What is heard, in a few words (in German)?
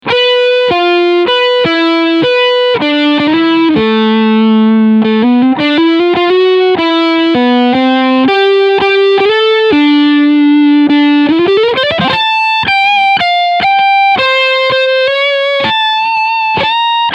Leadsound Steg PU